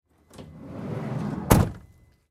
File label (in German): schiebetuer Lieferwagen schliessen